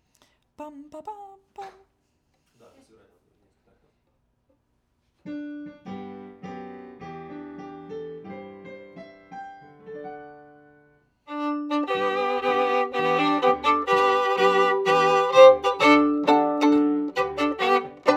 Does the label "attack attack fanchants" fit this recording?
No